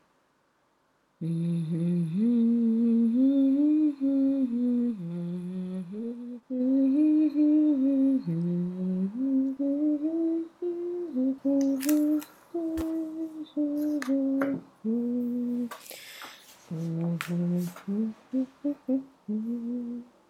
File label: Humming Girl